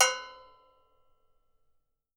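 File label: deep cow, right, Percussion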